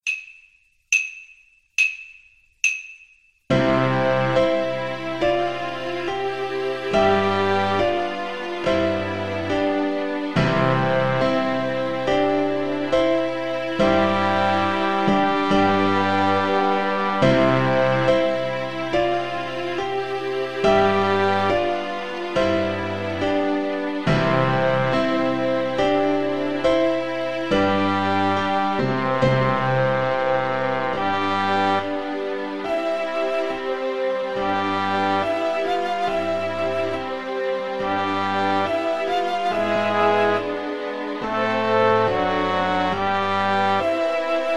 ode to joy, Trombone

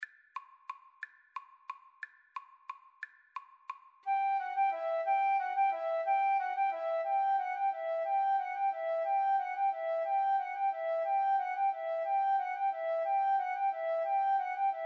carol of the bells, Violin Flute Duet

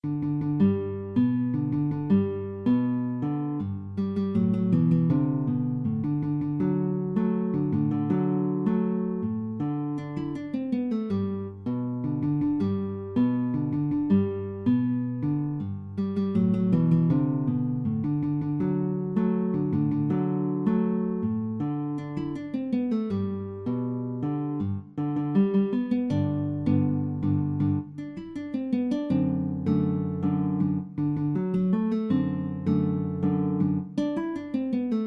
guitar

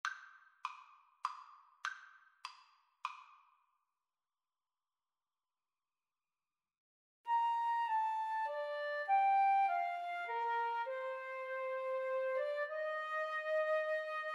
Flute duet

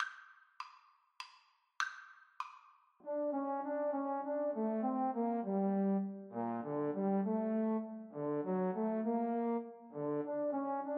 fur elise, Trombone Duet

